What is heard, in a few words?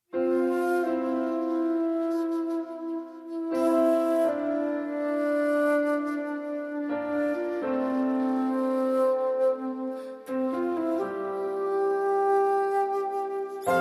Sad Music for Mobile Ringtone